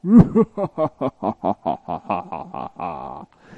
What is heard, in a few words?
evil laugh